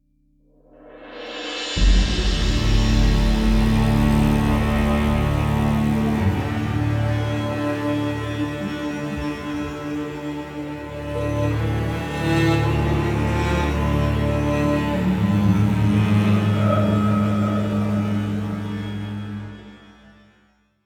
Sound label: Monster Hunting; Skellige